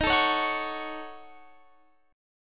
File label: Eb Minor 7th Flat 5th, down, Guitar, Open F; strummed